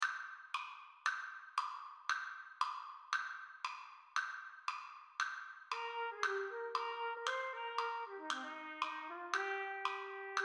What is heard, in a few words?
Rodeo Parade March - Bb Trumpet; Trumpet 1 Only